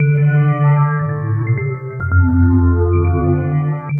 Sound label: Melodic; Sonic Foundry (Sony Creative Software); Myths of Technology